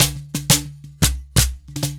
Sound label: DRM; Best Service ProSamples vol.52 - World Instruments 2; AKAI; MIX DRM LOOP